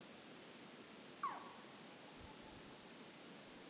Chouette de Tengmalm cri; Aspe

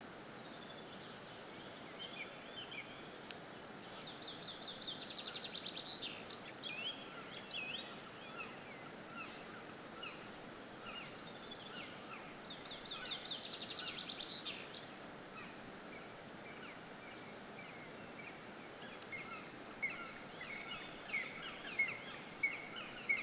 Pic noir cris d'un couple; Issaux

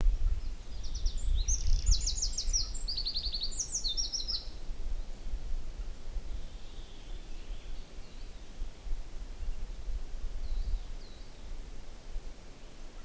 Troglodyte mignon, Issaux

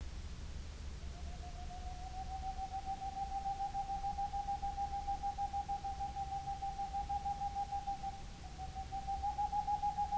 Chouette de Tengmalm chant diurne; Aspe

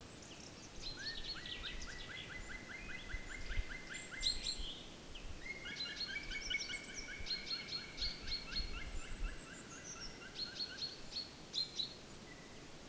Pic noir chant; Issaux

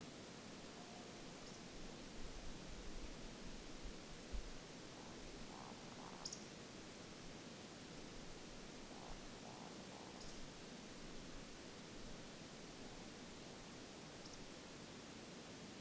Bécasse des bois croûle, Forêt d Issaux

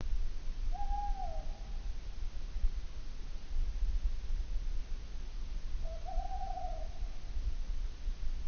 Chouette hulotte chant; Issaux

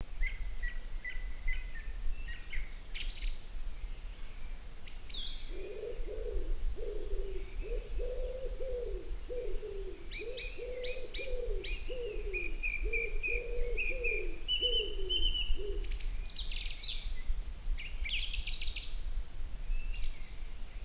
Pigeon ramier et Grive musicienne; Issaux

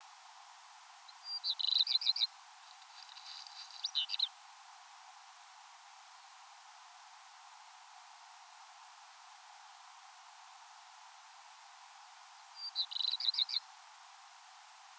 Rougequeue noir; Ossau

